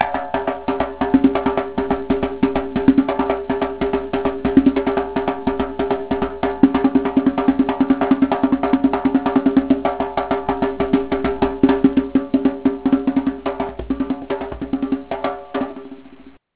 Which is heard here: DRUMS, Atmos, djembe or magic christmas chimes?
djembe